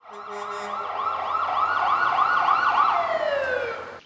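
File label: Fire truck; Firetruk; The Interactive Alphabet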